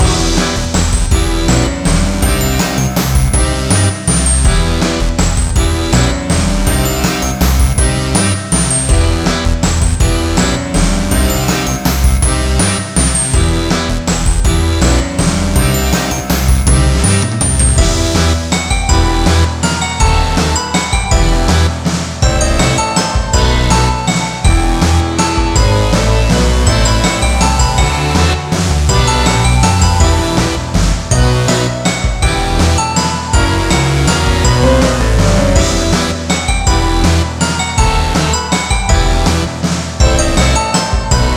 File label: BGM STAGE